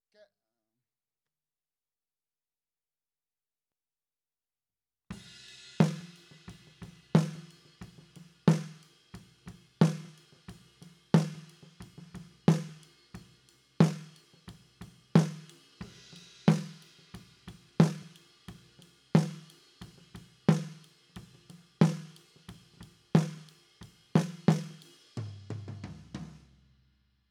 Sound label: SNR